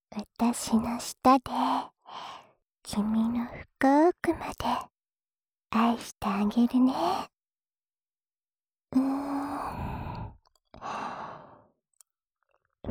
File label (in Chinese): 让人融化般的甜蜜舔耳与自慰辅助（左耳）; 柚叶让人融化般的甜蜜舔耳与自慰辅助（左耳）